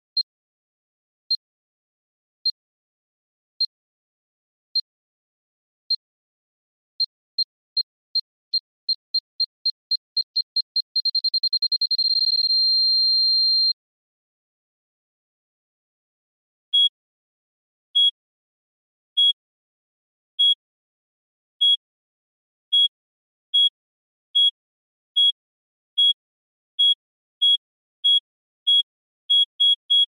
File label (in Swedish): Ljud hög ton pulserande enkel